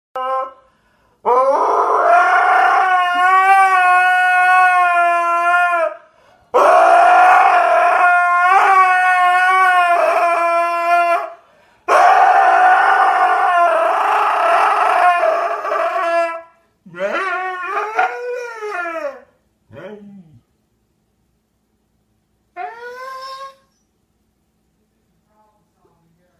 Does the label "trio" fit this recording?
No